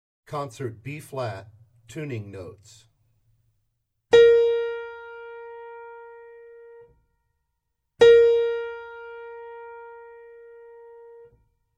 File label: Low Voice – Tuning Notes; James „Jamey“ Aebersold Play-Alongs